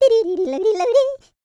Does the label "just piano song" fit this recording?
No